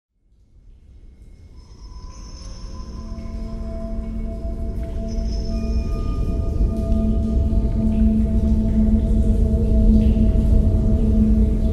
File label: Dark Ambience